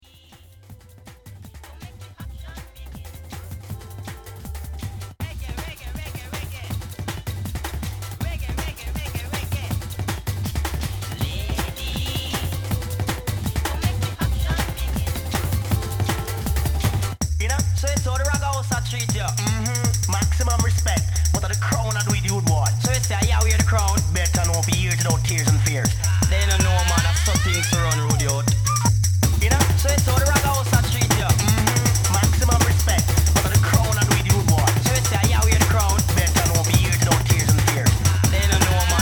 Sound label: Reggae